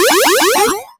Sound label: shield energy; Sci-Fi; freepixel